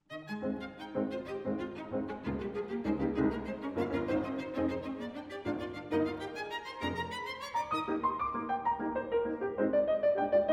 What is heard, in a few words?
piano trio; dubois